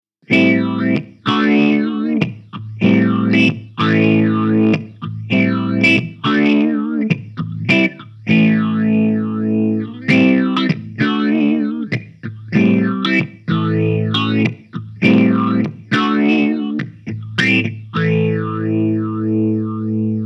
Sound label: Death By Audio Disturbance Fazer